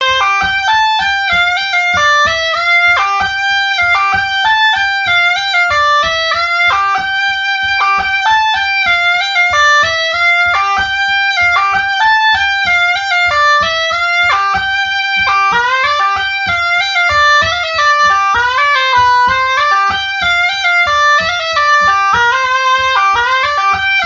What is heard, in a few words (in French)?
La Bourrée d Ussel; Bourrees